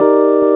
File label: piano keys